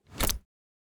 ui submenu close

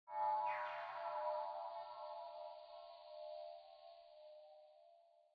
ambience room necromastery magic layer

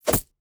object grab moneybag

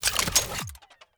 Drone Laser End; TANK; 3Souls

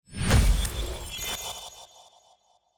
Illusive Wall Dissapear; TANK; 3Souls

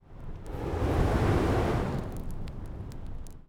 ambience room forge working layer

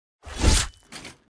crusader strike sword

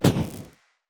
projectile; oracle; Shelter

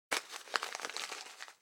ambience room shadow room working layer

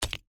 ui click

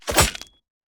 skeleton strike; Shelter

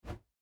mobs jump

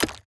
ui click menu

